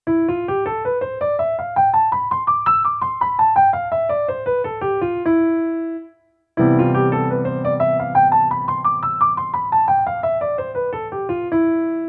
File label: E Phyrgian against Cmaj7; keyboards; tips for practicing technique